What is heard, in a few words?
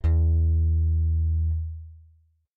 e1 bass; matching game